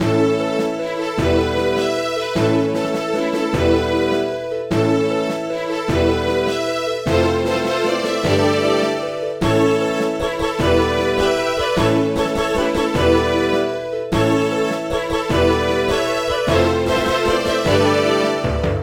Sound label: Bgm guild expedition